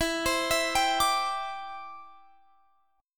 E Minor 7th Sharp 5th, down, Guitar, Standard; arpeggiated

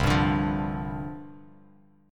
C Suspended 2nd Suspended 4th C Bass Standard; Csus2sus4 strummed

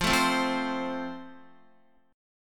F Major, Guitar; strummed

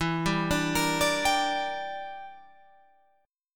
E Minor 7th Flat 5th, Guitar, Open F; arpeggiated; Em7b5; 16,14,14,17,17,14

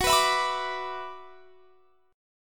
F sharp 7th Suspended 4th Sharp 5th, guitar; F#7sus4#5 strummed

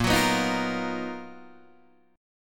B Major Sharp 11th A sharp

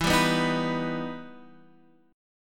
E Minor Major 7th, down, guitar chord; EmM7 strummed

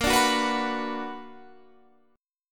B Minor Bb; Bm/Bb; strummed

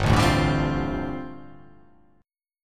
G 7th Suspended 2nd Suspended 4th

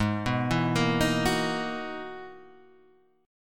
E-Major Sharp 11th G sharp

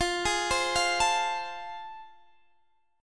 A Minor Major 7th Sharp 5th, F

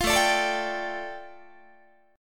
A 7th Suspended 4th Sharp 5th A, Guitar

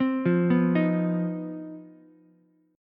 C Minor Major 7th Double Flat 5th C down Ukulele; arpeggiated; JGuitar

